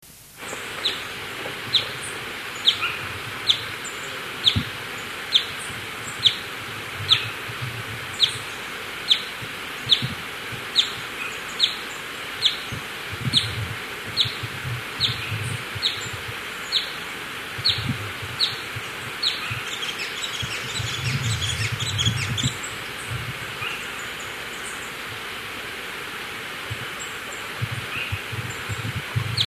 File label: fawn-breasted brilliant